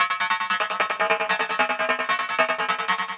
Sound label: synth, Hardcore Breakbeat; Big Fish Audio; Hardcore Breakbeat Action; Rhythms 4 Life 151 Ebmin